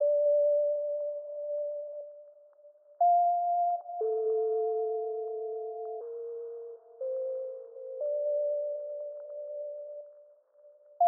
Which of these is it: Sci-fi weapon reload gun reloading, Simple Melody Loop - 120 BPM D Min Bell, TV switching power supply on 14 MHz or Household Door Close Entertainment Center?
Simple Melody Loop - 120 BPM D Min Bell